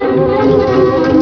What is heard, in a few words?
witch music; Bip Boing & autres